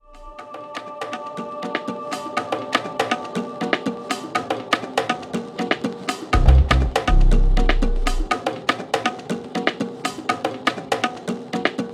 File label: horn club mix